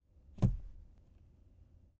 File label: vehicle door handle